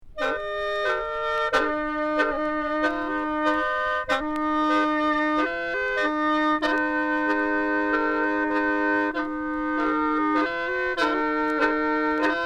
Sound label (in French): musique triangle